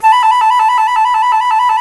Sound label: C flute trill